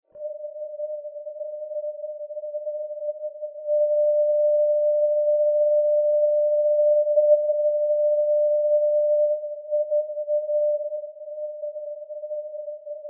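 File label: non-directional beacon; indija; europe; ndb indija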